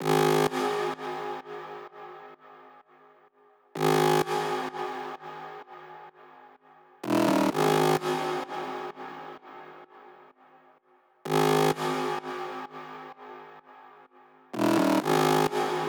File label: 128 BPM Saw Add SC, Bassline, Root G; Vengeance; Essential Clubsounds Vol.5; Demo Constr Kits; Big Line Kit